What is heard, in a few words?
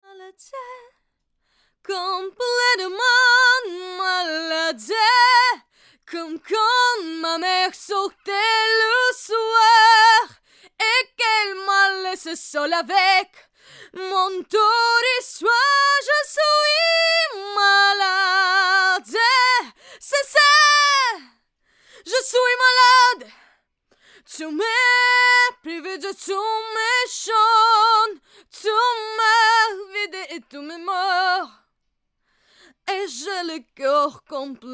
female soprano